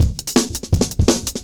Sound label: Jazz Shuffle Cut, Drum Loops; Zero-G Jungle Warfare 1, 2 & 3; 90 s Sample CDs